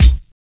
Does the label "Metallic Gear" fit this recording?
No